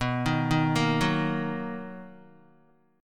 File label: B Major 7th B down Guitar; arpeggiated